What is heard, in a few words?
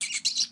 Rats; Rabbids Multiverse